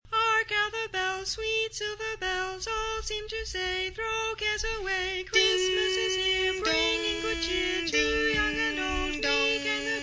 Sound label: Bells